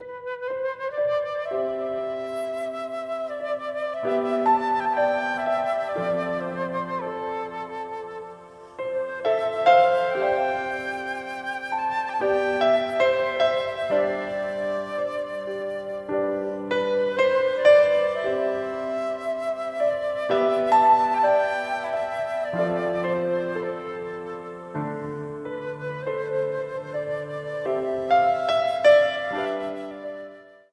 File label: flute; danny boy